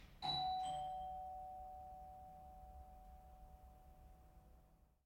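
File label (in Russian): звук звонка в дверь изнутри коридора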